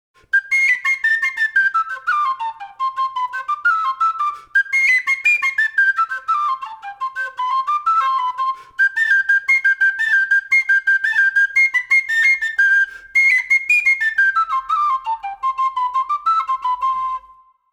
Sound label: Tin Whistle